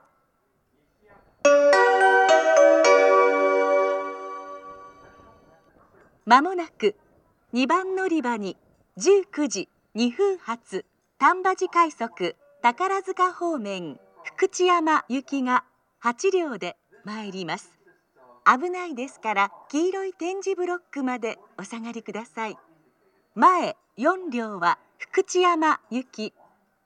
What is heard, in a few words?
rapid; tambaji-rapid; fukuchiyama; jr-west; amagasaki